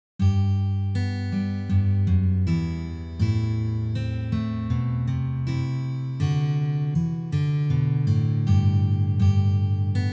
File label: Bass Run